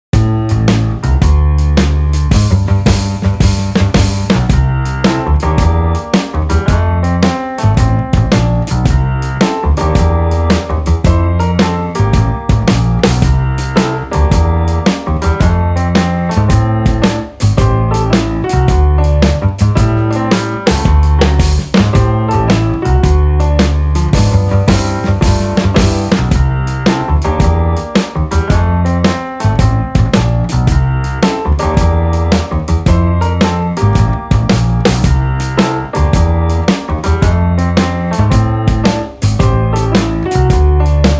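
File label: 110 bpm, blues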